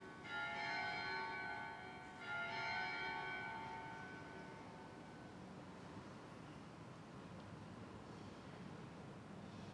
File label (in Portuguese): Sinos de igreja gravacao dentro da igreja reverberacao